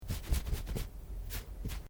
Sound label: Male Scratch Arm Through Cloth; Male Vocal sounds; Miscellaneous Human; Sound Effects:Human; Foundation3